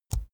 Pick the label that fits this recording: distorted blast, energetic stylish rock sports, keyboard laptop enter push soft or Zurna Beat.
keyboard laptop enter push soft